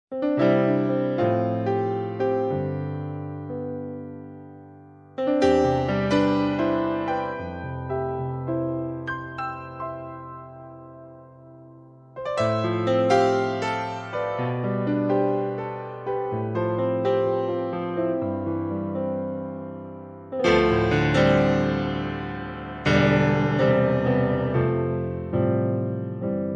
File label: Natural Kawai comparison